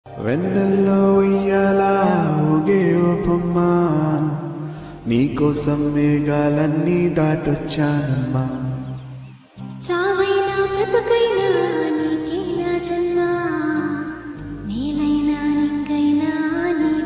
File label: climax bgm ringtone; nena raju nene mantri; kajal agarwal